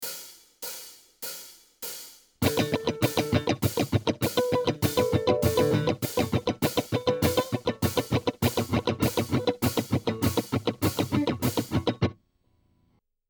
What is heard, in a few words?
strumming cutting basic bad example